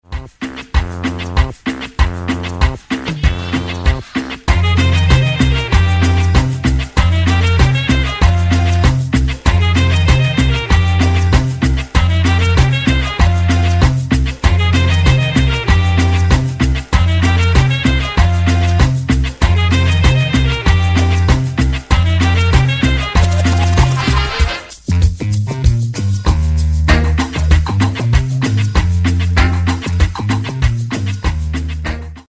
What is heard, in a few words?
Cumbia